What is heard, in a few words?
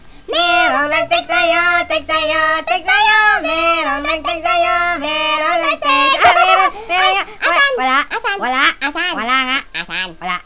Text Choir